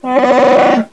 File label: WOOKIEE